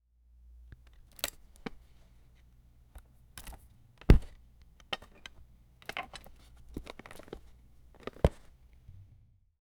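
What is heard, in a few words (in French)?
item ramassé, bag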